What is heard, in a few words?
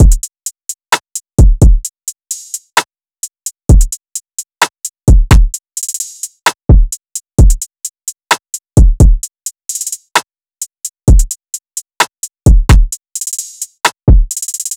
Drum Loop 130 BPM, Trap; Cymatics; ANALOG EVOLUTION Beta